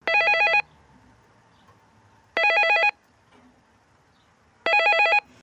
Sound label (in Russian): Звук домофона Элтис; на смс, звуки, русские, на уведомление, уведомление, стандартные